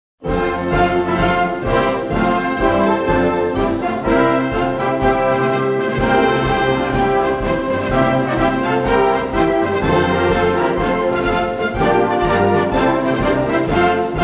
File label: anthem arab emirates